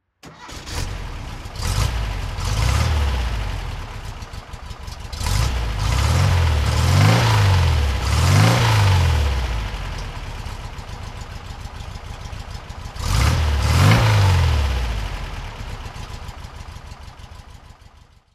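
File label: porsche 356 pre A 1500 throttle, coupe; Porsche 356 Pre-A 1500 coupe, 1953; Engine sound; V3D/ ClassicarImages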